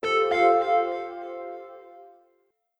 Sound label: New Mail, Windows Sounds; Windows Longhorn